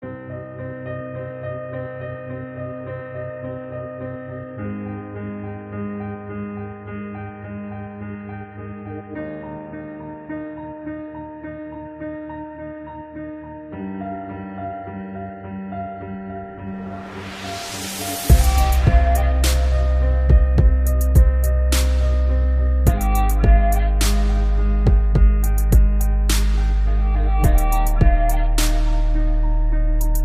instrumental ringtone

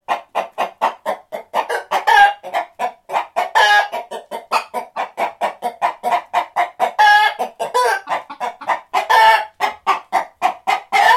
CHICKEN SOUND

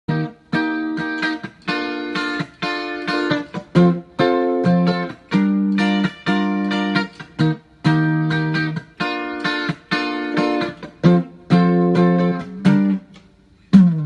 instrumental ringtone

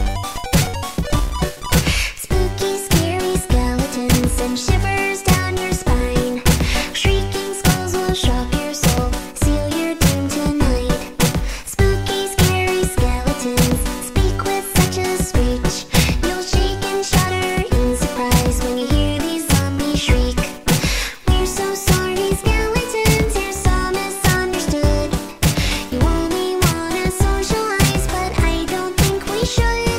spooky scary cute ringtone

